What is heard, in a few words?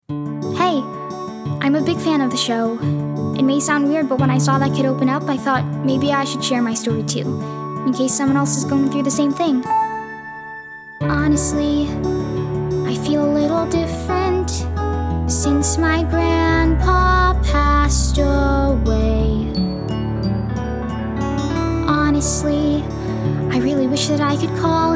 VOCAL; SAGE – is a genuine kid going through a tough time. The role requires the ability to be vulnerable.